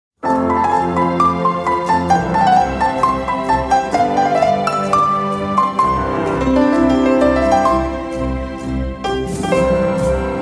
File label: TANGO ROMANTICO; final fade